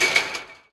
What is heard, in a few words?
fence climb; Quake 4 Alpha; strogg tik